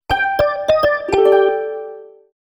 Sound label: Music BUMPER